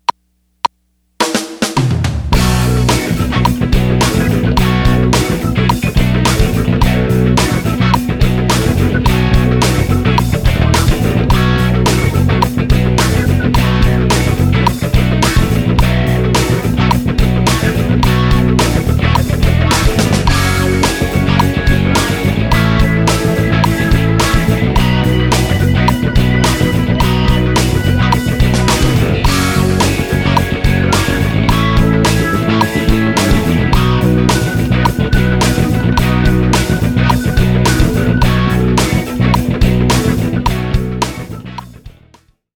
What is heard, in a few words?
Rock In B With Drums